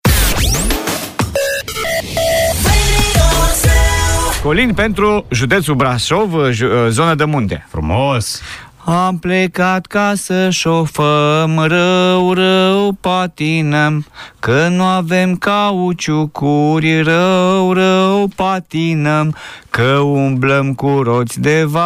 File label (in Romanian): Colind Brasov; morning zu